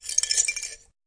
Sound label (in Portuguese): bambuzinhos hit; Weird and Interesting Hits; single hits